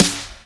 Claps & Snares

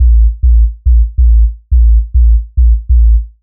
140 A BASSLINE, Dubstep

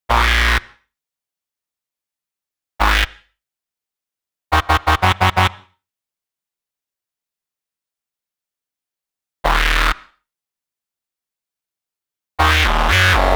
122 D#m Bass, Complex Electro Synths; Prime Loops; Invader

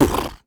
Human Beatbox, Beatbox Hits

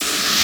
Hat, Dubstep One-Shot Drums

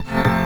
F STAB, funky; Loopmasters; Funky Underground Vol 2

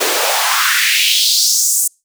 FX Uplifter

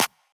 Dirty Dutch Clap; Mutekki Media; Vengeance Electroshock Vol.2

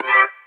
C STAB; Loopmasters; Funky Underground Vol 2

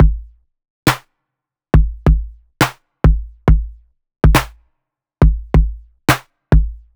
Bpm138 Break, Dubstep, Drum Loops; Blackhand

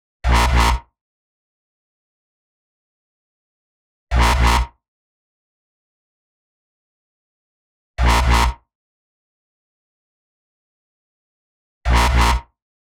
124 G Bass, Complex Electro Synths; Prime Loops; Elements

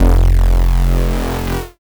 Bass Shot F

